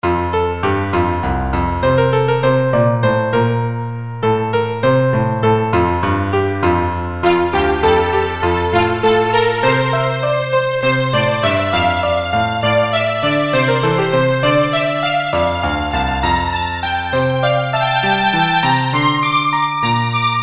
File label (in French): elegie pour violon et piano